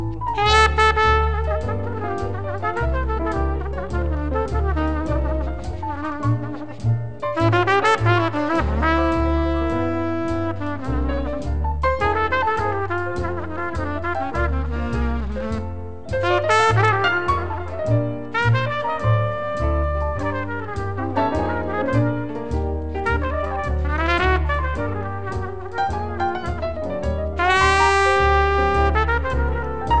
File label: jazz